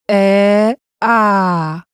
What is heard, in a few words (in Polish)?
eee aaa